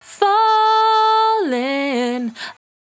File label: 68bpm D, Velvet Vocal; Zero-G